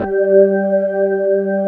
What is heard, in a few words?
B3 HAM, Keyboards Old School